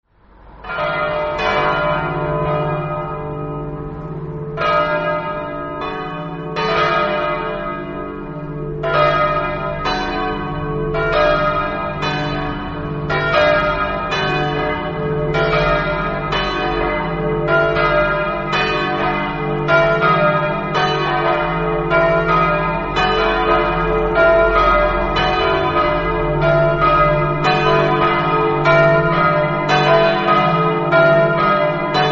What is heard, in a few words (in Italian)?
campane